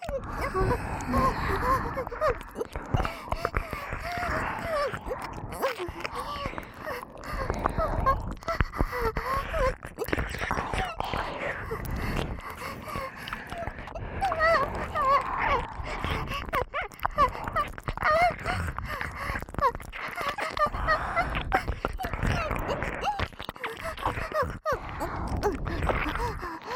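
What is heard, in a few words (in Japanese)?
本番喘ぎ SE無＋両耳舐め; おまけループ（オナサポ）; 3WAYループ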